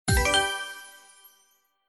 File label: REGULAR WIN; Lucky Wok